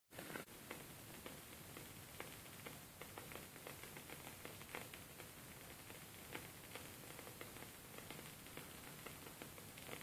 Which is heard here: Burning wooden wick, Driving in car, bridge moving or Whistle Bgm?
Burning wooden wick